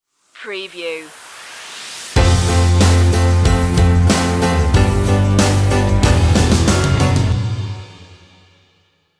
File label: going the distance stinger